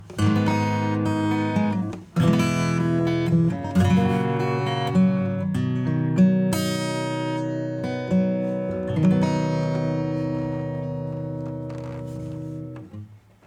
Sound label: acoustic Acoustic Guitar; ADC1 Compressor; Bully Your Acoustic Guitar